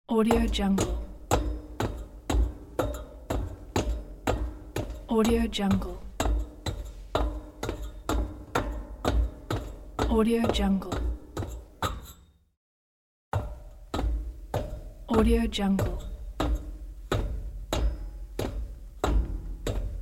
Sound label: footsteps sneakers on metal running and walking